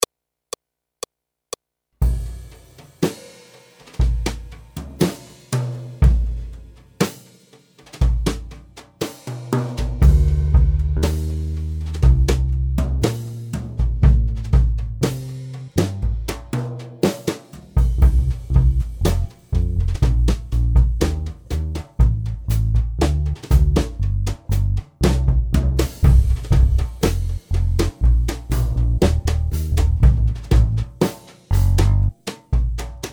Accompagnement seul; Jazz

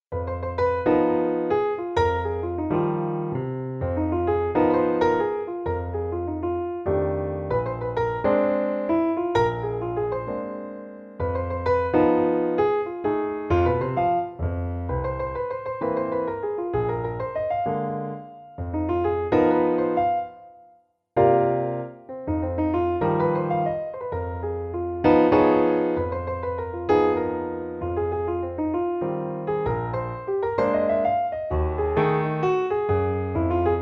Almost simple blues Piano seul; Jazz

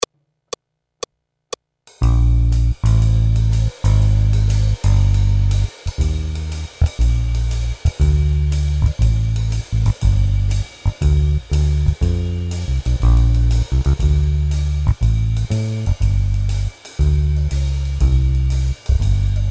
Accompagnement seul; Jazz

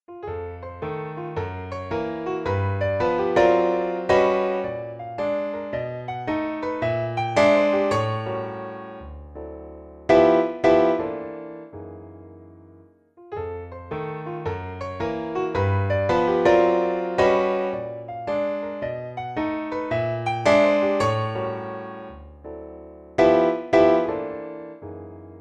Chromatic blues Piano seul; Jazz

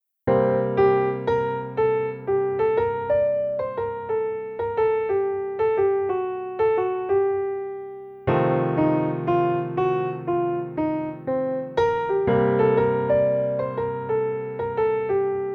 blues, Piano seul

